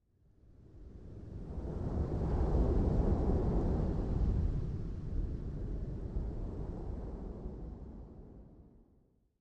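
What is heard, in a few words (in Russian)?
ветер предвещающий сильный шторм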